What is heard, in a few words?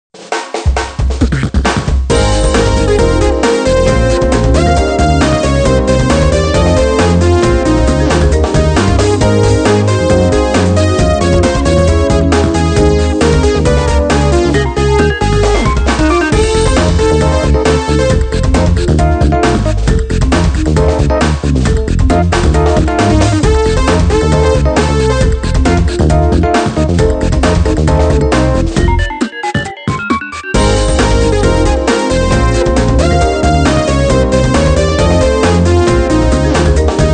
Music Success event; Jikkyou Powerful Pro Yakyuu 2014; PS Vita